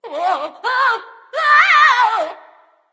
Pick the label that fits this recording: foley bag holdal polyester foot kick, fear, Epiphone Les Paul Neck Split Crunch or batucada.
fear